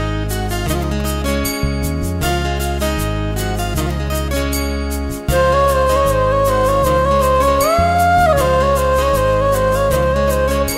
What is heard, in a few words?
hindi christian ringtone, christian gospel